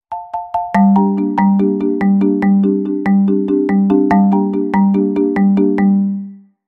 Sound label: Marimba Tension Sting